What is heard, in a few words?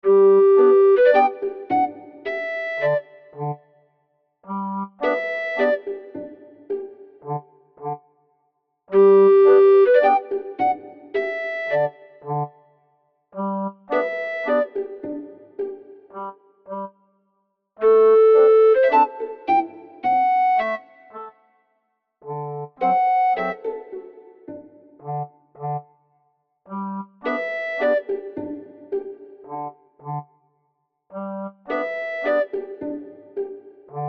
music